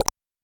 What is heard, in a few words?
btn Click